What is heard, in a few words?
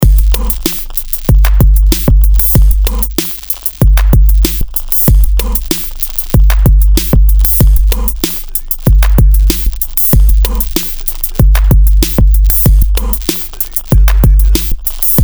8-Bit